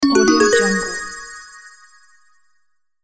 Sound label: record bonus